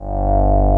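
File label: analog pad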